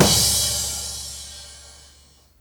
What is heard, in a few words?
crash, acoustic drum